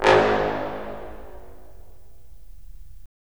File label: BRS, Roland LA Composer